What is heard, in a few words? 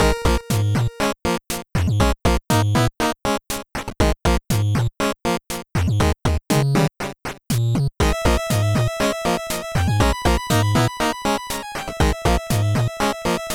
8Bit loop; PMD2; Aegis Cave